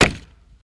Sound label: Axe Chop Wood Sloppy